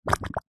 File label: cartoon slime goo bubbles swamp single; zapsplat